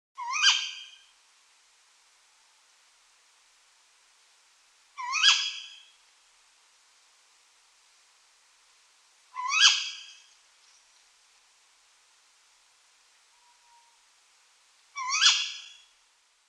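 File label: tawny owl voice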